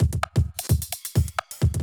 electro kit 130

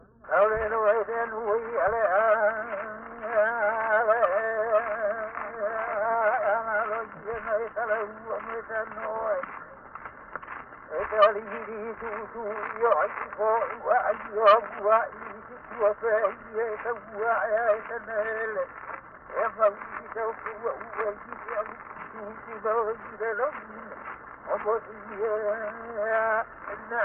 Place-name chant, Hawai'i; World Music; Hawaiian; Na Leo Hawai'i Kahiko - Voices of Old Hawai'i

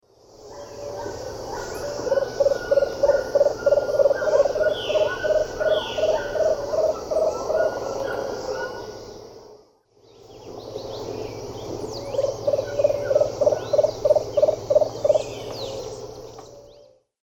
Eubucco richardsoni, songs 2 birds; S. America; Eastern Lowlands

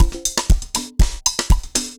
dancefloor perc 120 bpm

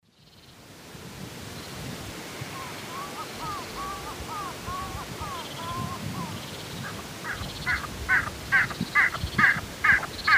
Gallinago paraguaiae, calls; S. America; Eastern Lowlands

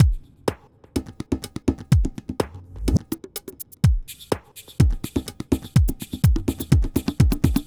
ethnic groove 125

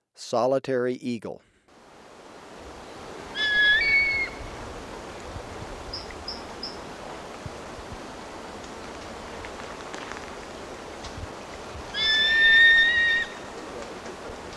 Solitary Eagle; S. America

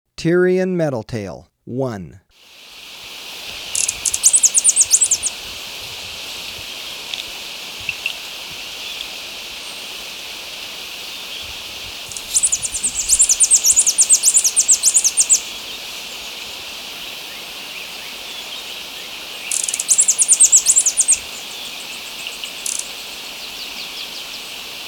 Tyrian Metaltail, Birds of Ecuador; S. America

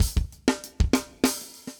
brute, metal

